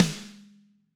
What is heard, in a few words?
Snare Hard